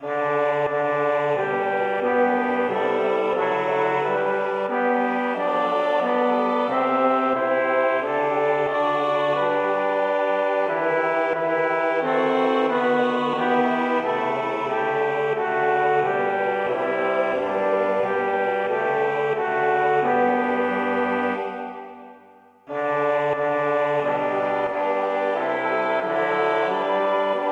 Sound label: polyinstru; hymns; Jesus Thou alone art worthy